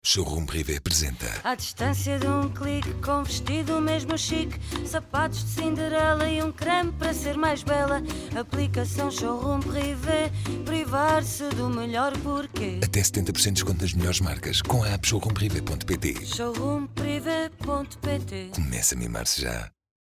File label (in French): musique